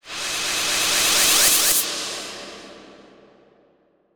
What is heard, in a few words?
Reverse Fx; musicradar